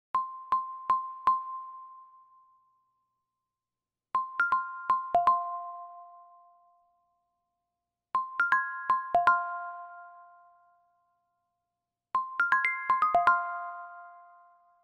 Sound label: quad, nada dering iphone